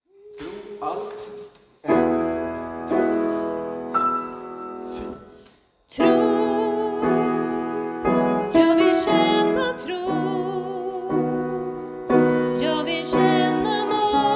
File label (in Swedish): Tro Alt